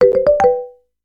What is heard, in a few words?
triumph, bildirim sesleri